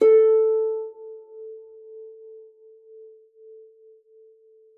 HARP FORTE G#4; Xsample VOL-8

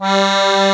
HOHNER, akkordeon

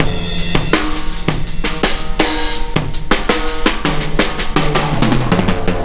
Stone Room 82 bpm, breaks; Zero-G Beneath the Planet of the Breaks

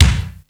Kick, Drums Percussion; Drum Bass; Zero-G; Total Drum Bass

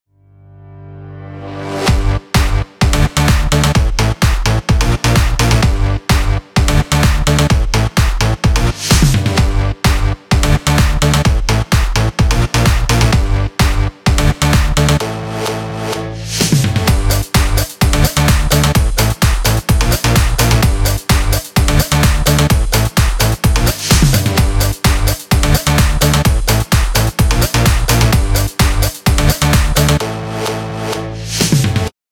128 BPM MIX, G; Vengeance - Essential Clubsounds Vol.5; Demo Constr Kits; Slide Kit

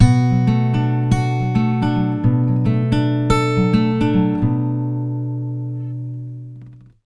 110 MIN PICK B, Acoustic Guitar Loops; USB Soundscan vol.04 - Electric & Acoustic Guitar Loops; AKAI

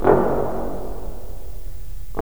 BRS, Roland LA Composer

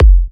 F, kicks; markus hakala kicks

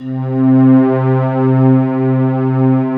ARCHAICOL, Ambient Atmospheres

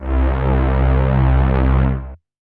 RAVE, RAVESYN

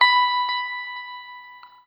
NOODLE LONG, Guitar & Bass

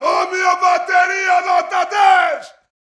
BRAZIL, World Voices

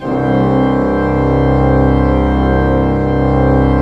PLENO C1, Cathedral Organ, PEDAL PLENO; Propeller Island

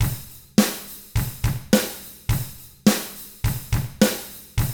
105 POP RK, Drums; ILIO; Double Platinum Drums 1

